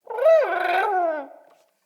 Trapped Sprout Vocal; Friendly; Sproutfolk; Fey Hub Sprout

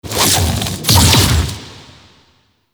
Death Impact; Warframe; Dante

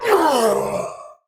Death, Battle; Warframe; Tau; Dax; Knight

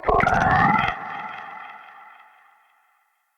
Shrimp Vocal Death, Creatures; Vocals; Baliq Shrimp Glade

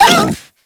Kubrow Feral Vocal Pain; Enemies; Warframe

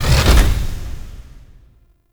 Interior Orb Station Door Large Open; Warframe; Tau; Orbital Station

